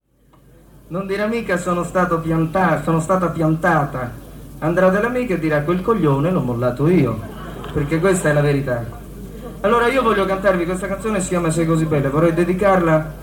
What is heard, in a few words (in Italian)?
inedito voce e chitarra